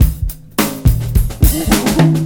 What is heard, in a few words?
DRM, Breakbeat